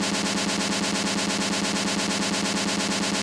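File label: kawai k1 acoustic SNARE LOOP; wavetables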